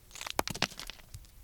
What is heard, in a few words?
Tumbling Stones; SyrinScape